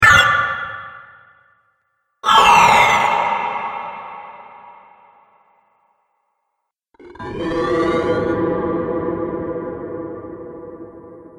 Sci fi Sounds; 5 Sci-Fi Sounds - Production Pack 1

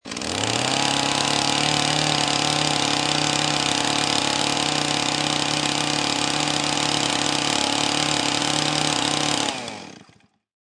Chain Saw Run Turn Off; Saws; Machines; Lynne Music

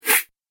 Whoosh; Film Fx

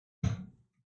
Quickly shutting a microwave door; Microwave Doors

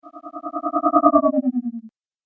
Magic Power Down; Magic Sound Effects

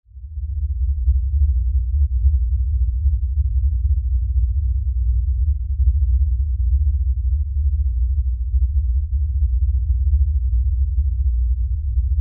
LFE Rumble, Lfe Rumble Low Bass; Rumbles; Blastwave; Sound Effects:Sound Design

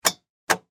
Lawn Mower Turn Key; John, Deer; BlastWave

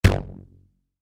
Rubber Band Snap; Miscellaneous Office; Blastwave; Foley

